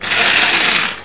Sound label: grinding; DoomsDay 2000 Demo